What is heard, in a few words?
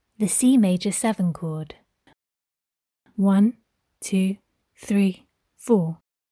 c maj 7 chord